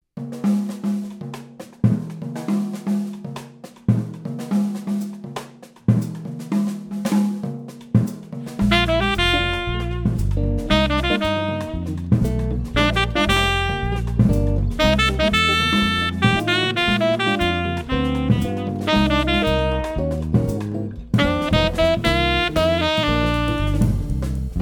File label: quartet, latin ensemble